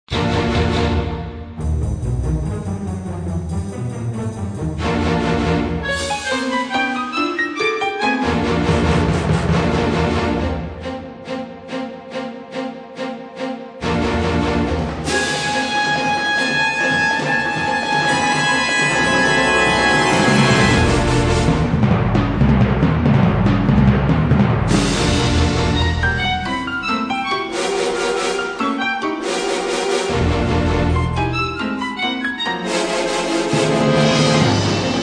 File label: Contemp Orch